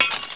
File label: CERAMIC, IMPACTS